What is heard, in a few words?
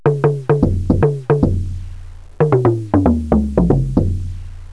Talking Drum